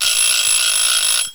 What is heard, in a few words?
timer; Banshee Screamer Alarm